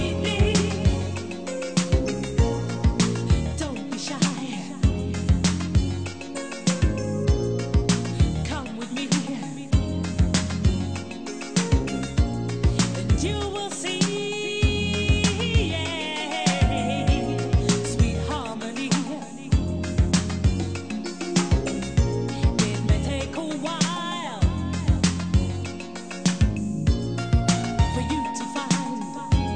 disco

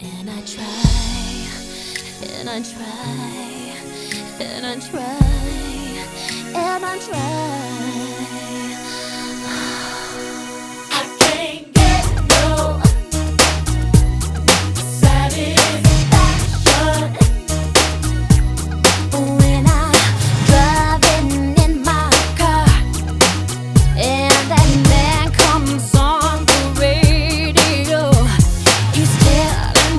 pop

